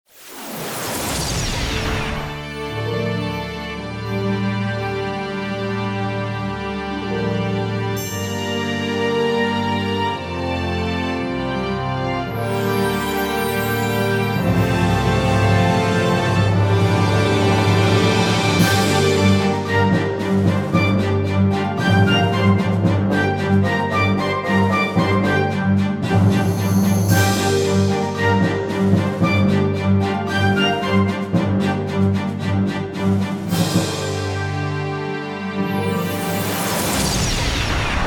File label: orchestral